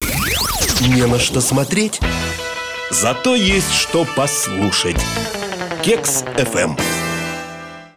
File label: jingle